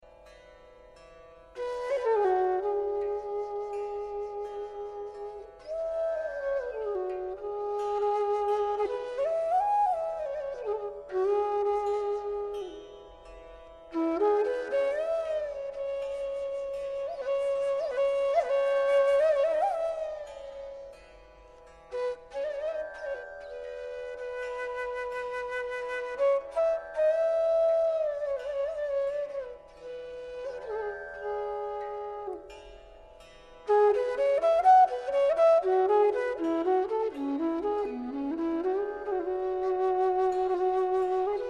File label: raga